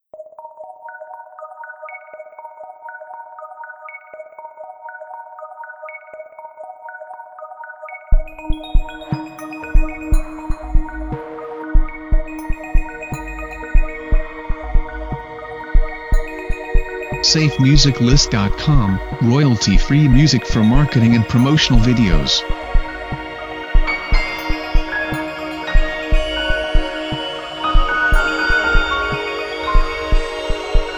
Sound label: Particle Traces